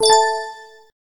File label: warning; firefight